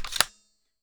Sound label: m24 bolt back, sniper; weapons; garrysmod